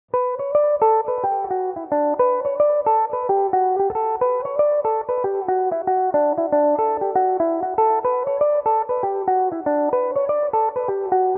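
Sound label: D major, mandolin; Hoddam Castle; Belmuse EM-190 electric mandolin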